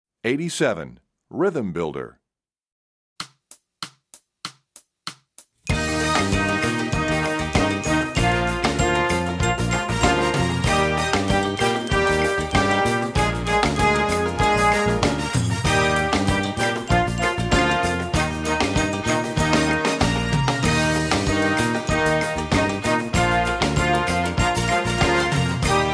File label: Rhythm Builder, Brass WW; EE2000 Book1